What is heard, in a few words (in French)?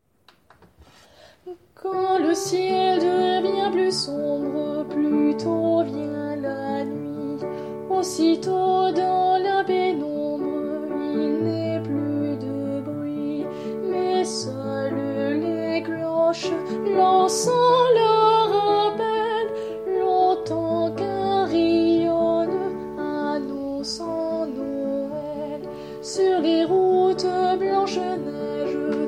Noel anglais voix